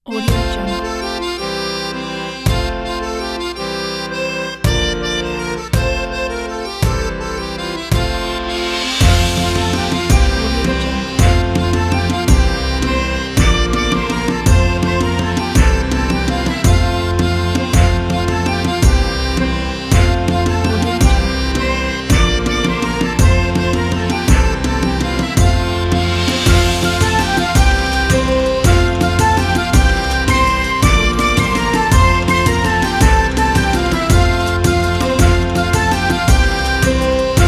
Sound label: pirate song